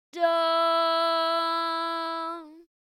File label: sung tonic